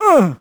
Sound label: squish; Crypt of the NecroDancer